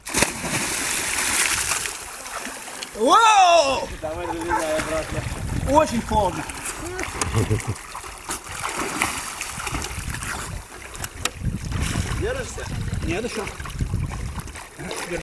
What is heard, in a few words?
swim; wrangell